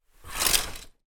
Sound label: household curtains draw hotel